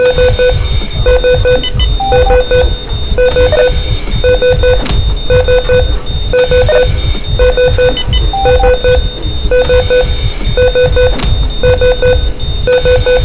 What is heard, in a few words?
trauma, ambiances; CosmicRage